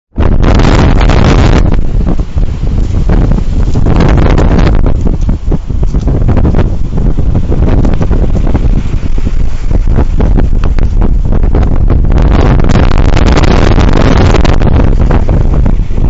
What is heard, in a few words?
beachfront; cable bay